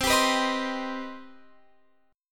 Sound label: C Minor Major 7th C Guitar Open F; CmM7; strummed